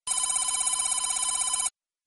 phone

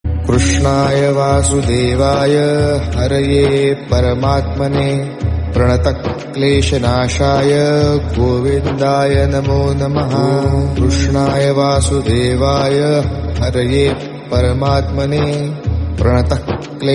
devotional mantra

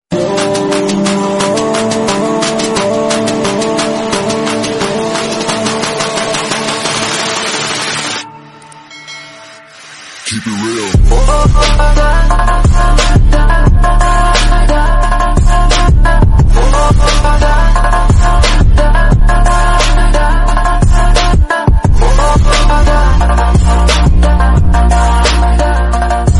background music; free fire; fact video

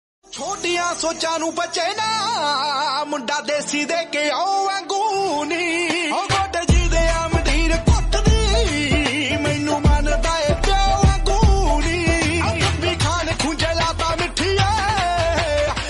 song instrumental

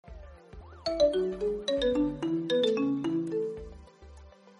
nokia 3310 notification tone

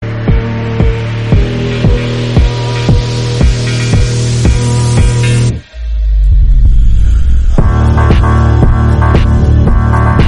slowed reverb bgm

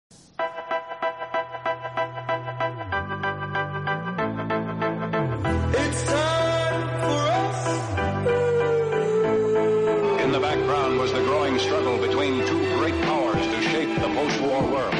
cricket pop song; icc world cup

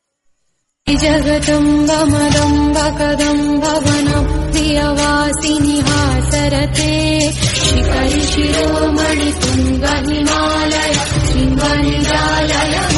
durga chalisa hindi

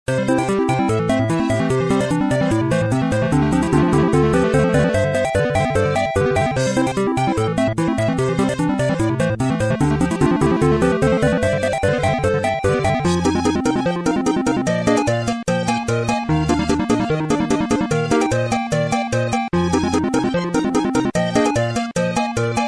slot machine free fall bgm theme

